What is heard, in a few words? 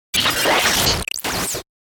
Distortion Glitches Wash Fast; Production Element Imaging Element Accent Transition; 5.1 STEREO MIX; Blastwave